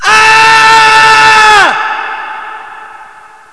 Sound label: ahhh, scream